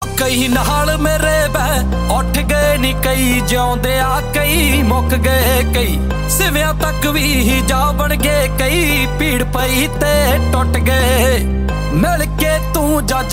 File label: Punjabi; song